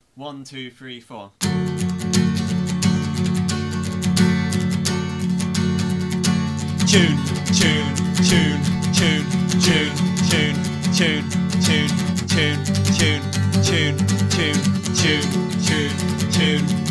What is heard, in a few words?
Tune (acoustic); Bettysoft; Seahorse Project